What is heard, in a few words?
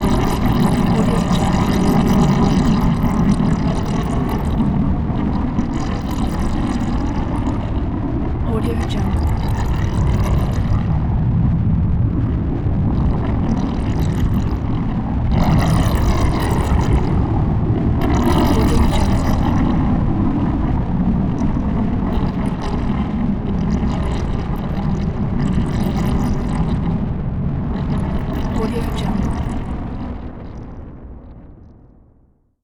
magma